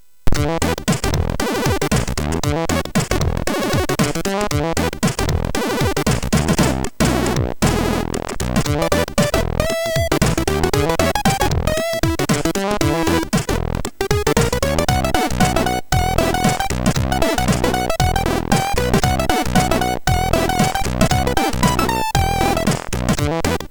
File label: Monkey Blues, MOS6581R3